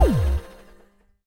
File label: ui enter; Steven Universe - Beach City Drifters